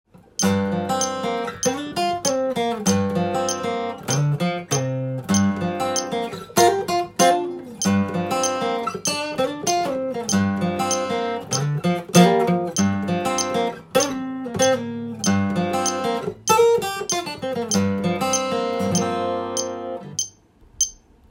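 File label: g minor solo arpeggio, guitar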